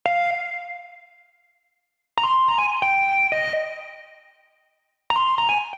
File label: F Minor 83 bpm; MIDI Clips; Tonal; Melodic; Bari Dust EP; Ableton; Factory Packs; Chop and Swing